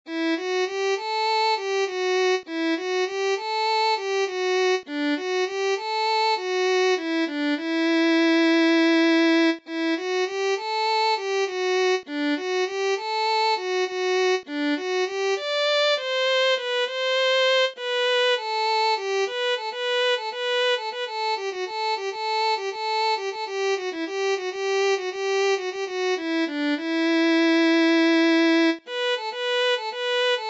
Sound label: Violin